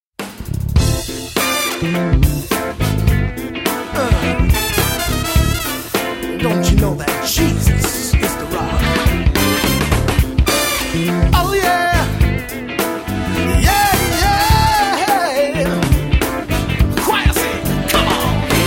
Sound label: vocals